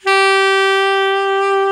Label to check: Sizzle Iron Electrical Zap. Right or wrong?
Wrong